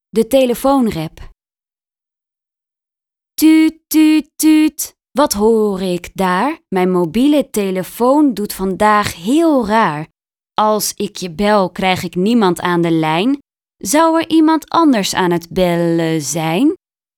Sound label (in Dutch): De telefoon rap; communicatie; leerjaar-2; taalsprong